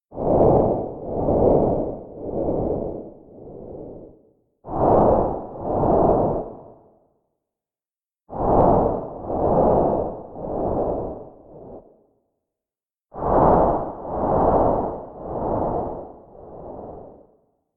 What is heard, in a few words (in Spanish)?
respiracion; explorador